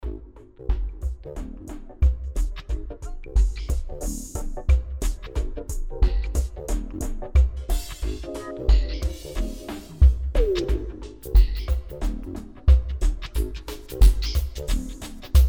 burpin groove

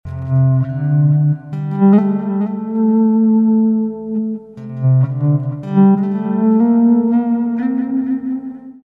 Bass Amb Vol D 109

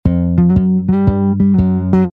Bass Chords Gb, Gb, G, Gb 117

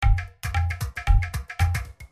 Tabla

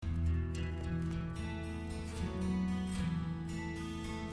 Picking E 57

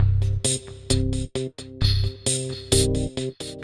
Funky 125 Cm7; Loops

